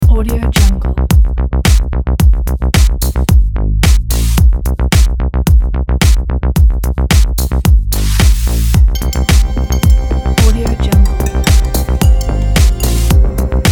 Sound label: energetic techno intro